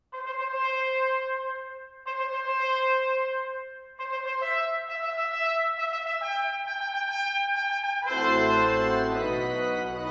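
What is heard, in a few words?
wedding march trumpet and organ; weddings; mendelssohn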